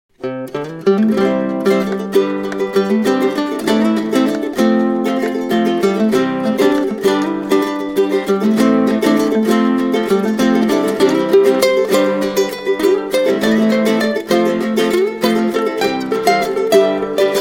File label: Guitalele Jam